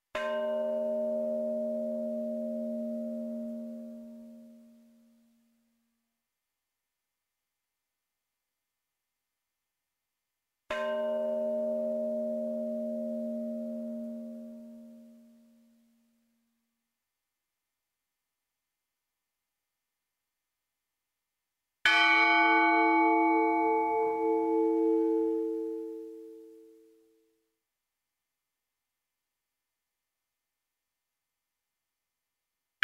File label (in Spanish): Campanas